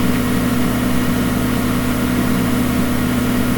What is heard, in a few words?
computer closed